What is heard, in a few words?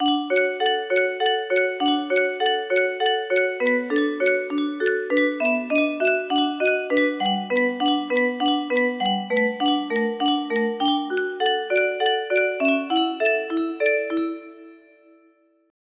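music box melody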